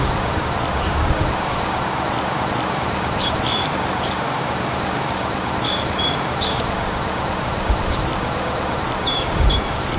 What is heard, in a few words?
a quiet peaceful meadow